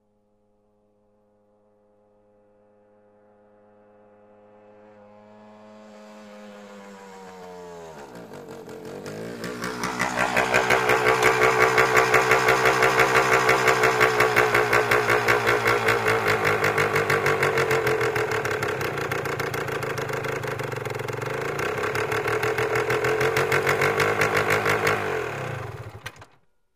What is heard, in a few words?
Motorcycle approaching, turning off